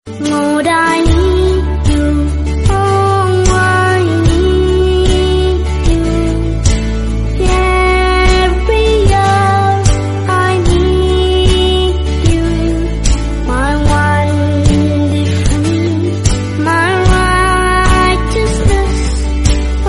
christian english

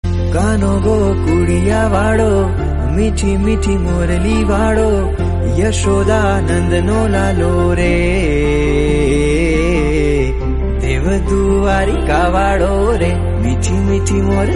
gujarati song

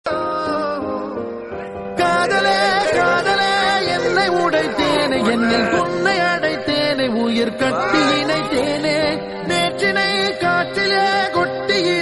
bgm background music

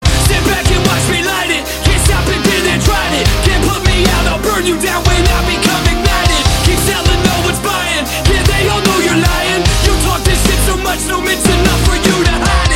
instrumental song

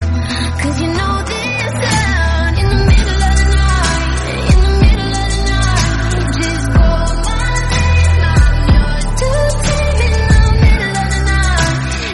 japanese version

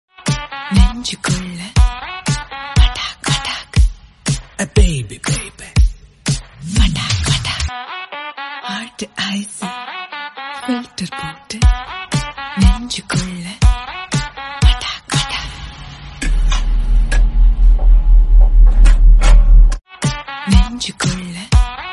tamil, bgm

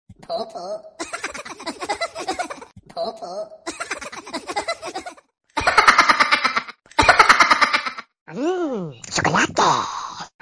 funny alert; minions

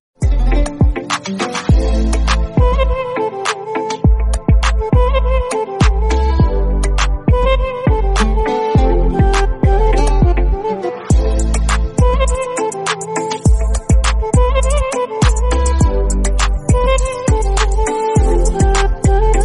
trap remix bgm

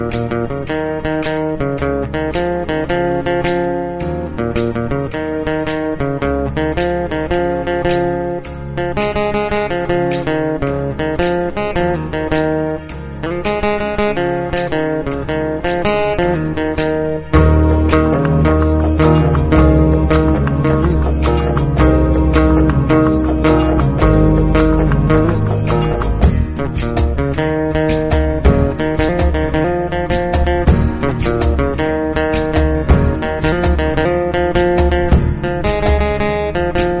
bgm telugu instrumental; ala vaikunthapurramuloo; pooja hegde

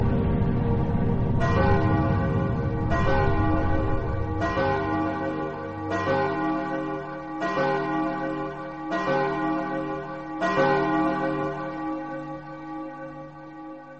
clocktower bells

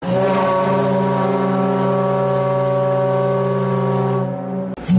ship horn sound notification tone; titanic

